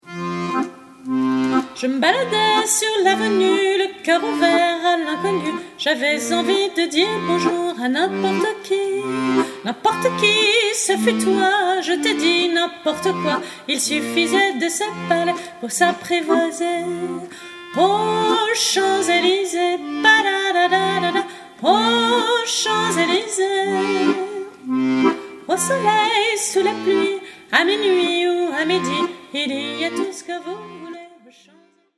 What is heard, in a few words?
french